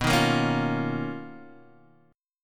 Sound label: B Major Sharp 11th, B, down, Guitar Standard; strummed; JGuitar